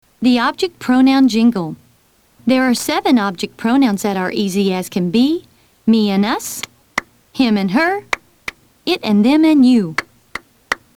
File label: object pronoun jingle